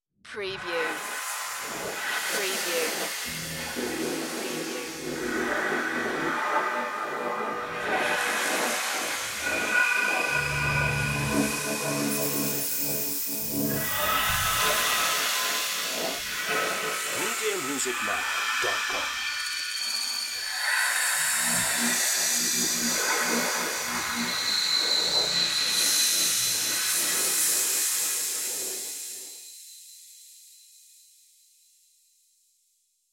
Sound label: static dimension glitch